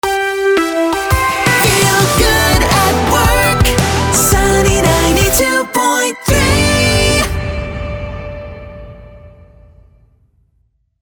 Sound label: Feel Good At work Jingle; Sunny Sweeper – At work